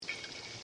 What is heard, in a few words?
clang